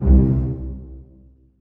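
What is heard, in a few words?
string pizzicato C1 mezzo forte left; Sound On Sound - Peter Siedleczek's Orchestra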